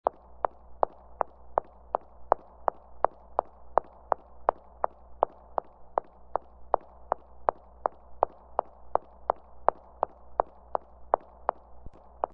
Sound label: Footsteps High Heels, a woman in high heels walk; Footsteps High Heels in Hallway; High quality stereo recording of a woman in high heels walking down a hallway.